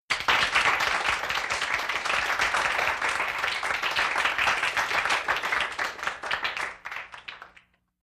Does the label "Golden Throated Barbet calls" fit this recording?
No